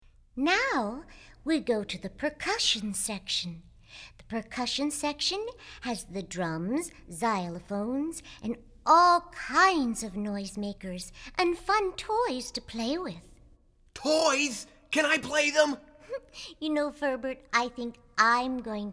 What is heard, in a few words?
Percussion